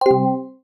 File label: Video Stop; Amazon Fire-Max-11